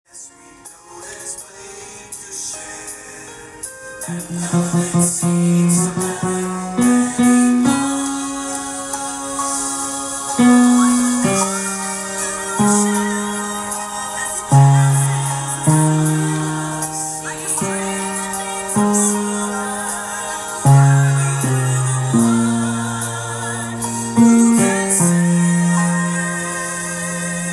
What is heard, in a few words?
For good bass